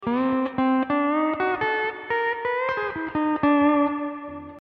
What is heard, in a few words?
hawaiian piece